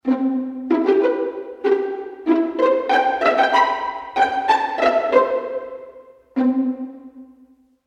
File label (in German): Pizzicato Violinen